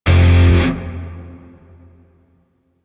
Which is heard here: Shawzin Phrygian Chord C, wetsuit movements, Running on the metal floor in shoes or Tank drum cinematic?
Shawzin Phrygian Chord C